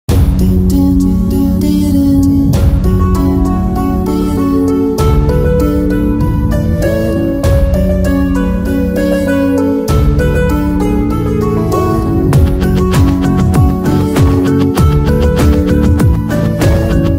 Teaser Tone Telugu Ringtone; Babu Bangaram

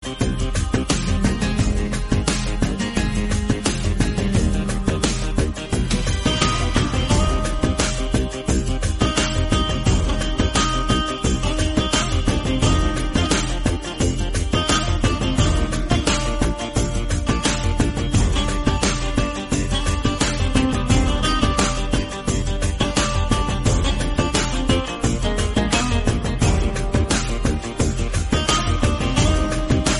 Guitar Ringtone

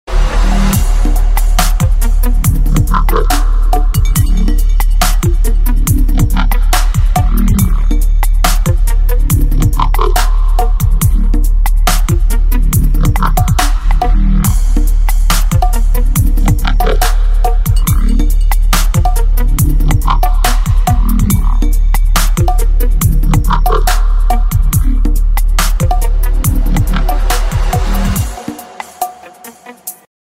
Chilling Ringtone

